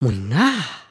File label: bow